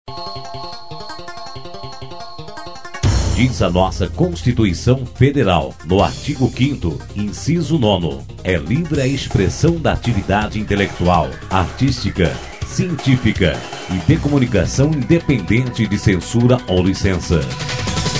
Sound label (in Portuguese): vinheta; artigo 5